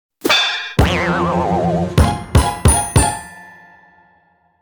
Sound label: Lose; Donald